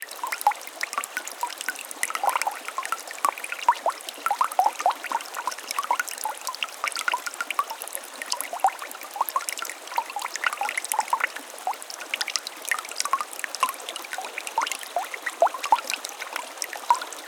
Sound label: wilderness flowing water waterfall small; Bluezone